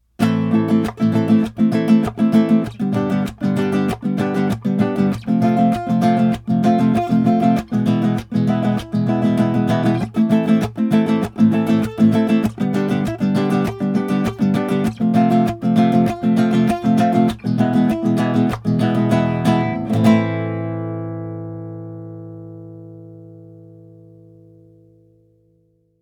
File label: Yamaha ntx 1200r strumming